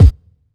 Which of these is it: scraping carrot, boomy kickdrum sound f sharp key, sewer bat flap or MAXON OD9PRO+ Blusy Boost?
boomy kickdrum sound f sharp key